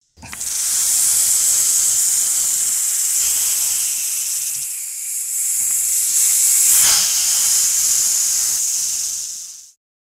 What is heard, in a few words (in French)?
serpent, loto sonore; pour les petits; loto des animaux